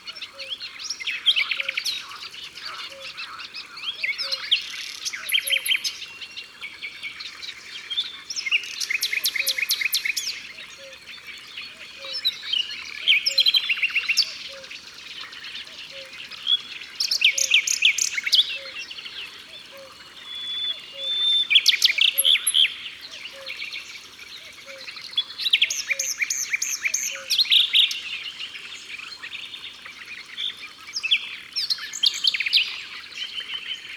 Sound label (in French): Rossignol philomele, Au bord de l eau